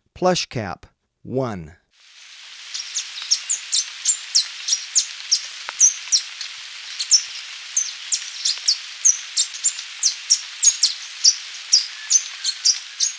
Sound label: Plushcap, Birds of Ecuador; S. America